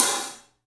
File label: LITE SAB HAT; 3D Sound; 3D Drums